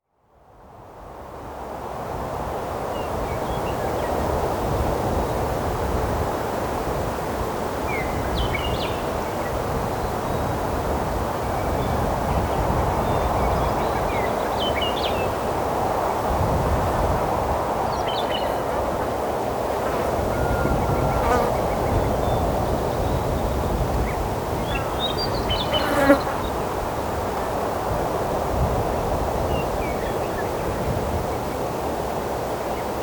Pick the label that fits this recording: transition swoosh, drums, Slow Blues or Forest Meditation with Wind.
Forest Meditation with Wind